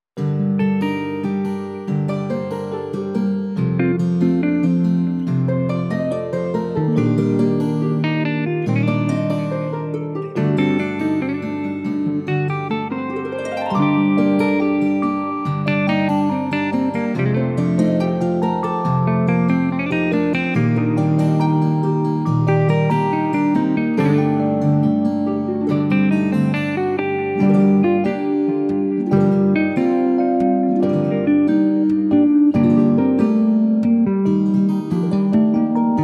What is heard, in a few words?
ARPEGES LIQUID